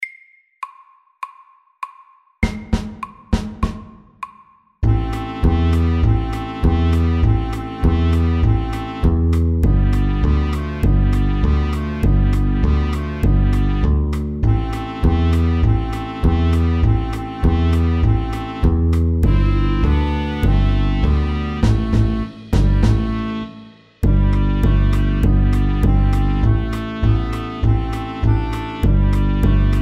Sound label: Balkan stomp; SAKTE